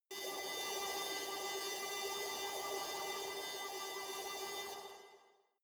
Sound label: space alien fabric machine buzzing high